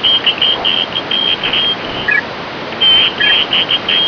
434 phone

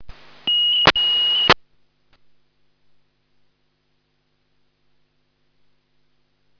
signal

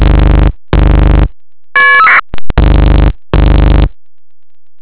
ring

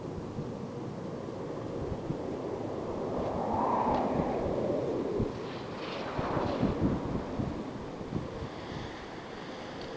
air sound

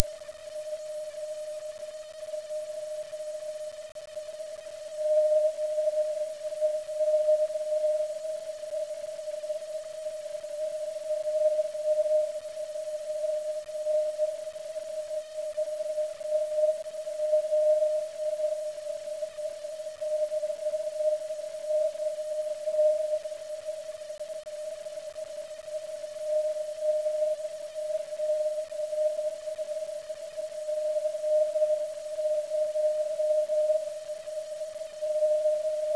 vox

